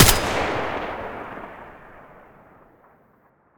M4A1 muzzle mono

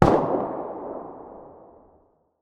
galil muzzle mono very far

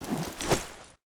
throw fast, weapons bolt

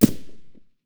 m4a1 silencer mono far, weapons

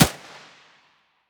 muzzle mono distant

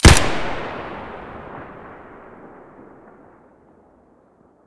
sks muzzle stereo, weapons

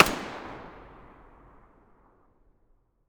mp5 muzzle mono far, weapons

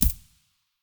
sig550 silencer far; weapons

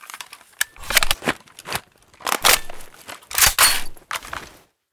usas12 reload empty

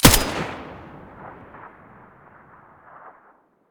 M4A1 muzzle stereo

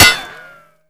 metall, bullet collide; STALKER; IWP

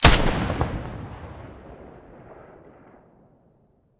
ash12 muzzle mono, weapons; STALKER; IWP

